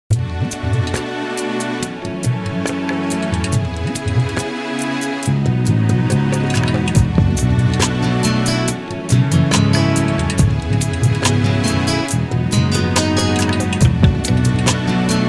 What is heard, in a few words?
instrumental